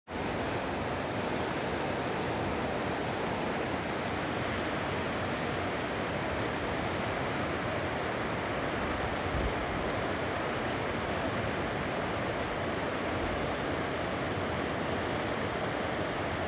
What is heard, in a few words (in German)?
alge tobt; akus